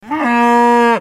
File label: Pet Buffalo Speak; creatures pets; Lego